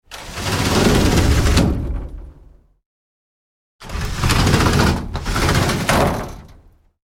Sliding Door, Sliding greenhouse door opening and closing